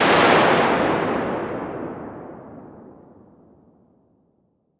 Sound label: Lightning Bolt